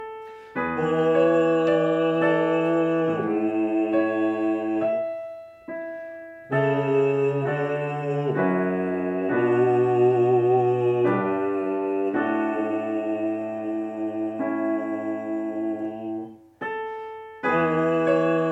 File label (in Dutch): zang